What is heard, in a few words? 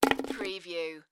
Christmas bauble dropped in a box; beanstalk audio